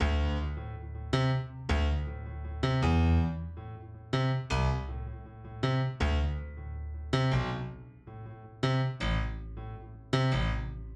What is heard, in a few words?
Something Piano With Hats